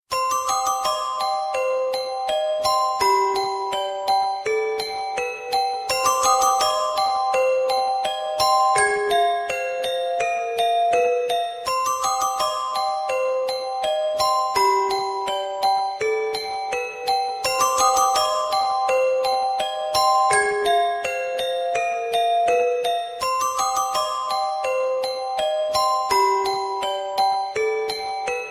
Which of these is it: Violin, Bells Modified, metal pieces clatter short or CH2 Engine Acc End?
Bells Modified